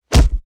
punch body hit; fights bodyfalls; Asian Show Sound Effects

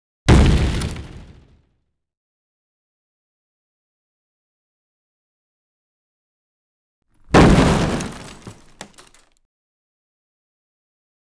impacts small, foley effects; Asian Show Sound Effects

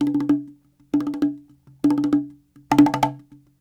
Cahon

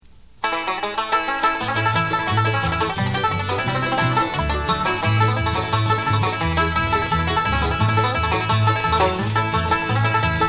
banjo

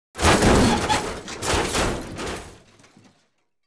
metal creak stress; Asian Show Sound Effects

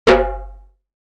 electronic bonk; music electronic; Asian Show Sound Effects

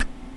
hum kiss

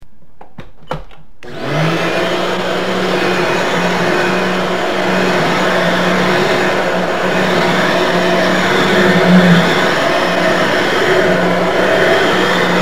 VACUUM, Vacuum Cleaner; We all love the sound of a nice, loud, annoying vacuum cleaner

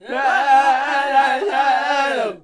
Dine group vox

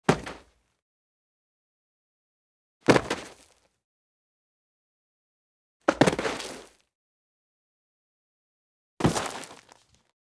body falls on dirt; Parkour; Butterfinger Video Sound Effects; Additional Parkour Sounds

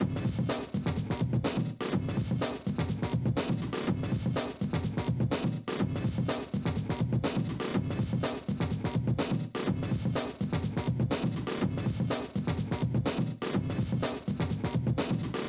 out of time beat

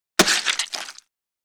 object being squash; fights bodyfalls; Asian Show Sound Effects

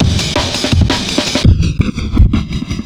loop

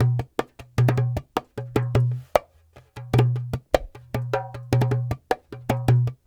Dumbek

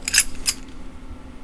chaching

